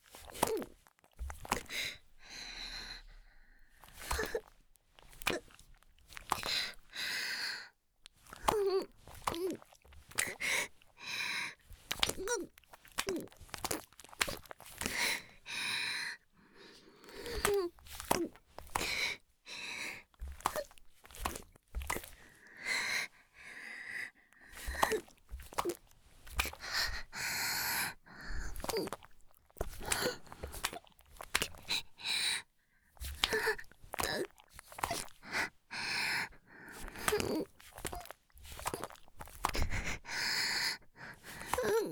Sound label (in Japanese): ギシアンループ; 田舎の別の無感情少女と自己犠牲愛; おまけ (第4、5話のギシアンループバージョン)